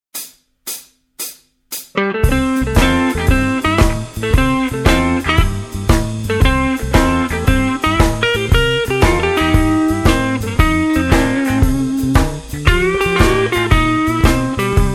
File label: blues jazz